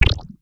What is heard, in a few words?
Sci-fi Pulse Gun Blast